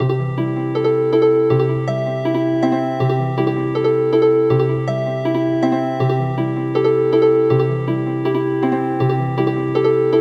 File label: Healin Harp